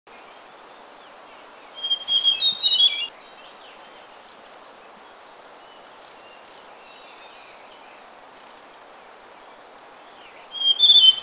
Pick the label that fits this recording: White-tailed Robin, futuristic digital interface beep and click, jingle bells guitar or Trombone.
White-tailed Robin